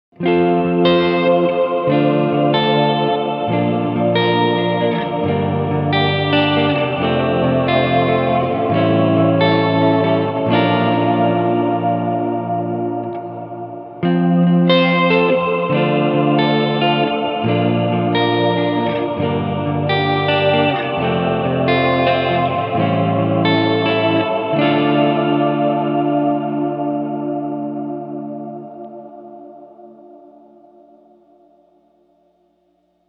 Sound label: chorale, bigsky; reverb; Backup Singers